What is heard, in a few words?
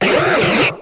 Cry; Pokemon Essentials; Pokemon Iberia V1.03